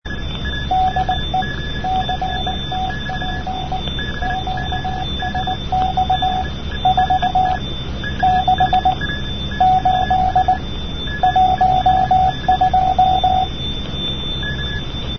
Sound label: portuguese navy cw; nato palhais